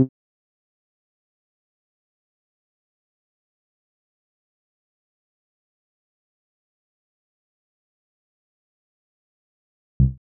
6 bpm

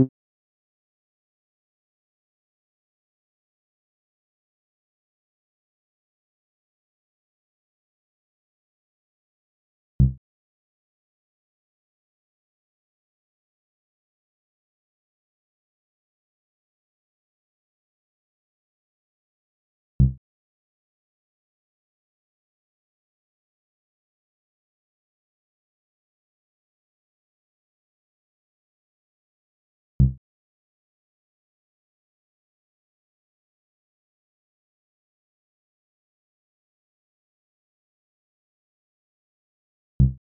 6 bpm

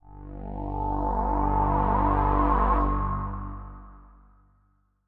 win

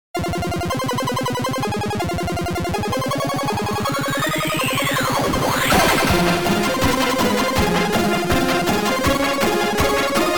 8bit

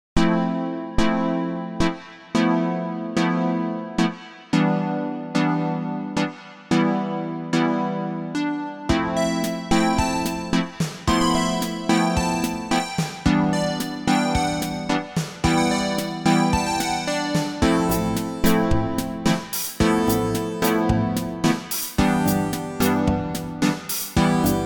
Jazz

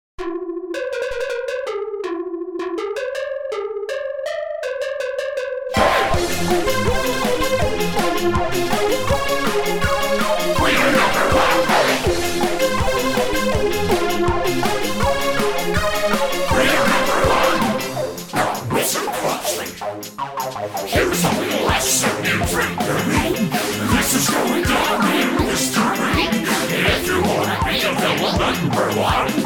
Flanger; Demake

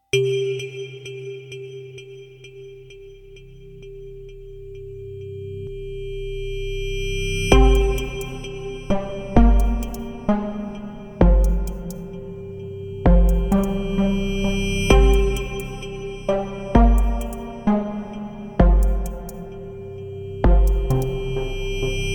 Plings

